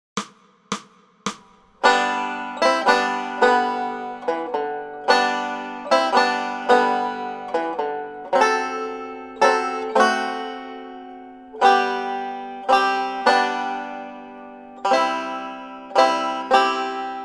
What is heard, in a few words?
Silent Night Banjo; christmas